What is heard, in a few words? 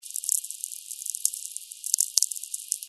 La Palma Sferics